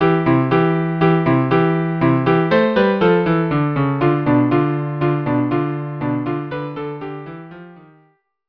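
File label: violin cello; Hanukkah Hanukkah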